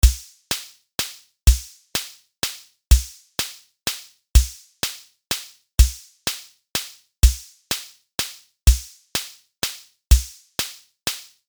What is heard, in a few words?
waltz